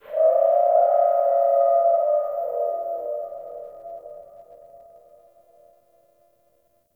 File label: Sentient Texture Medium, Ambience; Sentient Lair